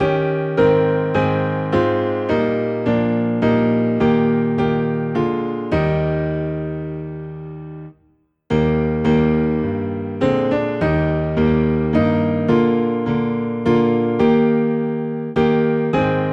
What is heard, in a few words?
Piano Low in E Flat, hymns; To God be the Glory